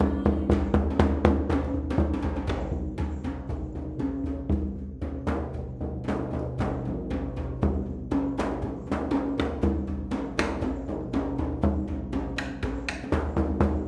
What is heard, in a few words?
drums